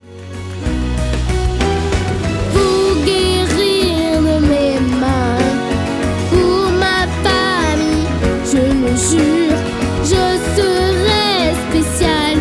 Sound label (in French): chant